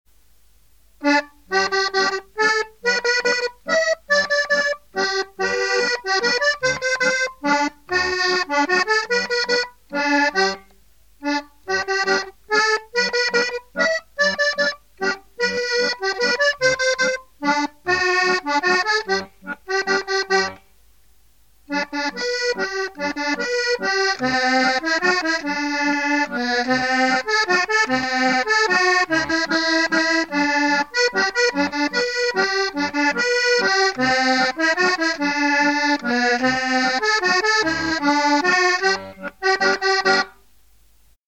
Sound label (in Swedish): staccato vals, flackekurs